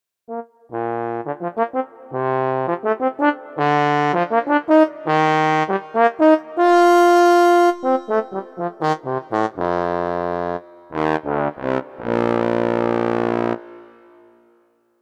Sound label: Euphorium, brass; Montage